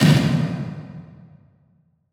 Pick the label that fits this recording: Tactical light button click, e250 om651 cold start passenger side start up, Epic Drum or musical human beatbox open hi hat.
Epic Drum